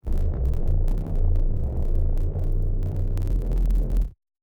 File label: Malfo Syn, malfunctioning synth